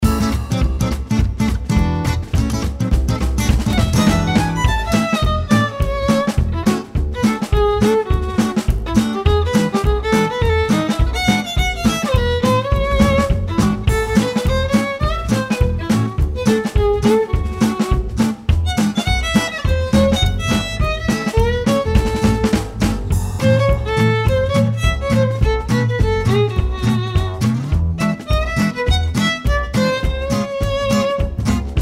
Swing; Nancy Drew Mobile Mysteries: Shadow Ranch

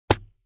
Cake drop

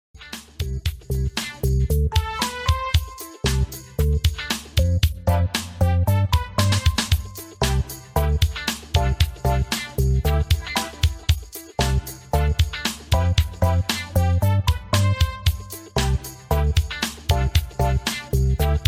Menu; Jig Words

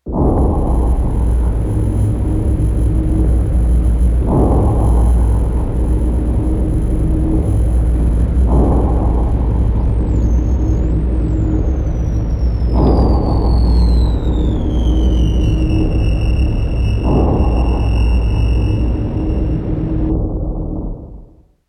dystopian prelude

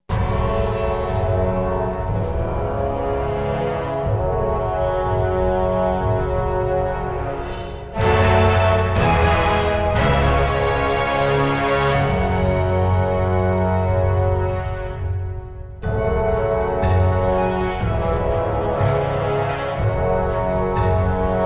Orchestra; rise of venice windows gamerip 2013

